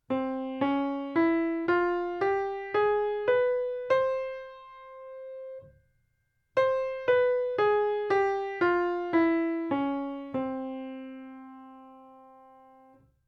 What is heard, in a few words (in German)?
Zigeuner-Dur